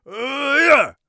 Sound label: heavy attack, Warrior Voice; Warrior Voice Pack